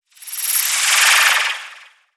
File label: Hip Sways; Evolve; Wraith